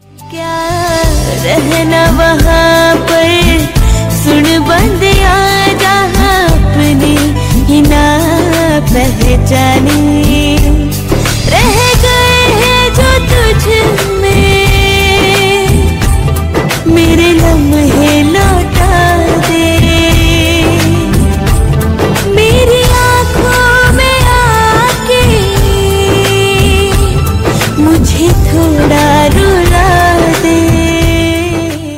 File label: Female Ringtone